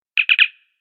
Star Trek TOS Comm

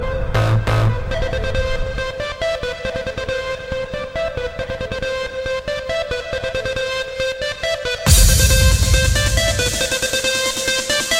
Disco

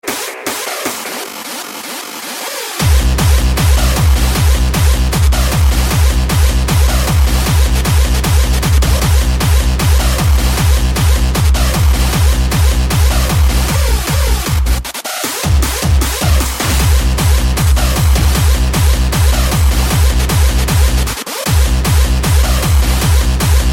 Techno

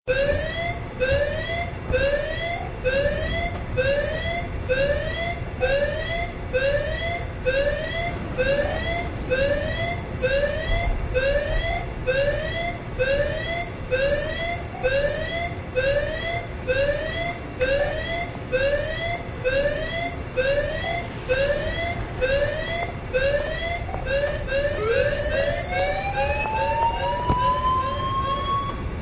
Siren; Bfbc2

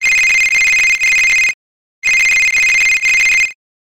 Classic Alarm Iphone

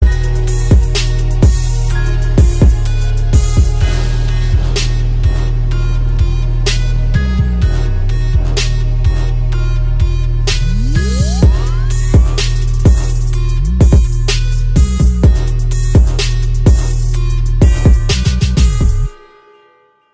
Hip Hop Beat

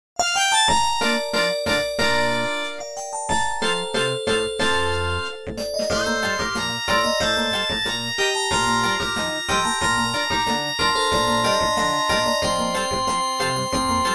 Harp Concerto

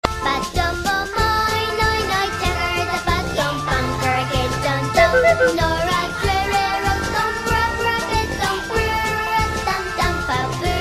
Loco Funny Song

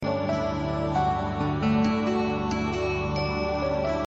Melody Alert

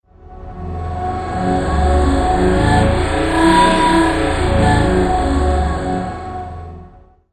Electro Girl

